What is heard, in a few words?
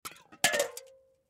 Beer Can Drop, Beer Can Drop Knock Over Full Closed; Drops; Objects; Blastwave; Foley